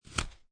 Playing Cards Slide One Card Off Top Of Deck; Card Sound Effects

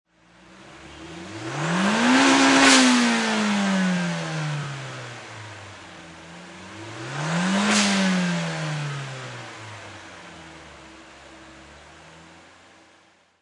Nissan Silvia Engine Double Rev, Race Car; Auto, Power Car, High Performance Car Sound: Nissan Silvia. Engine perspective. Motor rev, engine revving twice

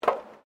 FOOTSTEPS WOMENS HIGH HEELS ESCALATOR STEP; Footstep Foley; Footsteps (Sound Effects:Human)

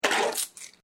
Coins Collected; Common coins; Money; Objects

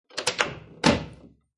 Wooden Door Close; Miscellaneous Door Sound Effects; Lynne Music

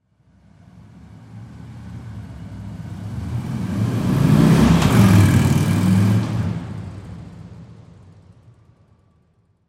Motorcycle Drive By; Transportation Automobile; Motorcycles; Vehicles

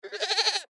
Goat Bah Short; Farms; Backgrounds; Farm Sound Effects; Animal

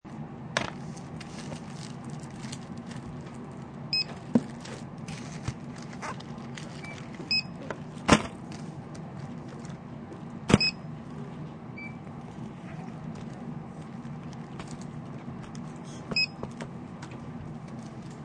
Library Check Out, Checking out books at library; Schools

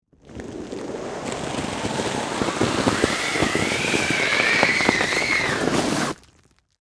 Snow Drag Inner Tube, Across, Snow, Heavy; Weather; Snow Sound Effects; BlastWave

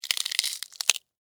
Insect Eat Chew, Bite, Crunch, Bug; Animal; Insect Eating; BlastWave

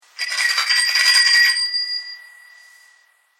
Bell Bicycle Antique; Bicycle Sound Effects; Bicycles (Sound Effects:Vehicles)

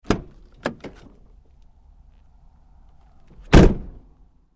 Truck GMC Savana Door Open-Close Hard; Trucks; Vehicles; 2010 GMC Savana,Door,Open-Close,Hard

